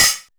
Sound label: TEK 909 OH, TECHNO; Drums In Your FACE!